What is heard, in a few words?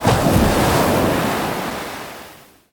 Missile Water After Splash; Destruction